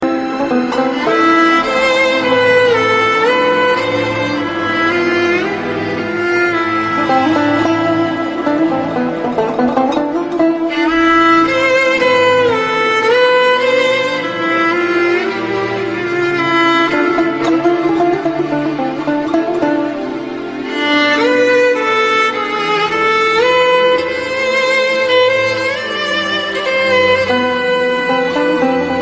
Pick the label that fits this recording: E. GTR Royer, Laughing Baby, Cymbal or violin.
violin